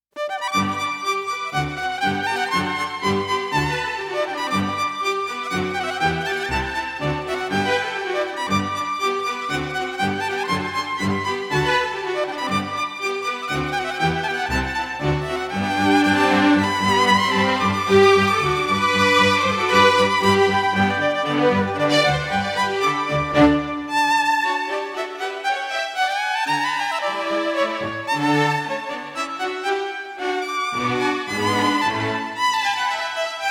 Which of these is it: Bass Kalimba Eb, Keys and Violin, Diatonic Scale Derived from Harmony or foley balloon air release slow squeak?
Keys and Violin